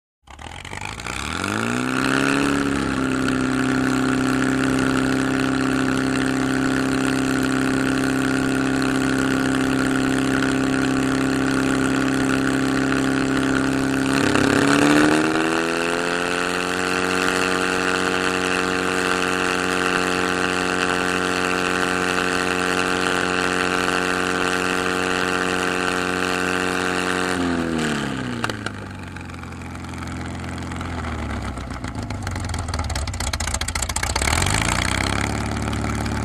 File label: Gas Engine Start Rev